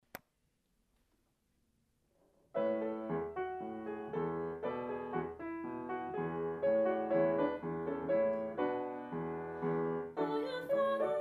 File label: vocals; Grannies and Grandads; durham choristers performance songs